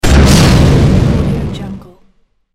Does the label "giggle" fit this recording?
No